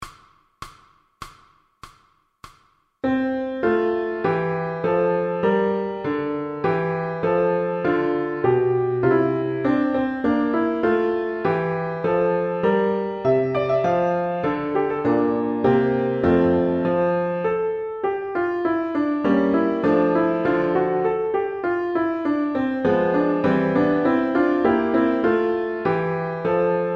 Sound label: VLN Menuetto and Trio, piano accompaniments for violin; Grade 3